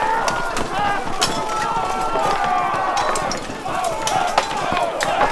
BATTLE Medieval Reinact Footsoldiers Knights loop stereo; Legends of Ellaria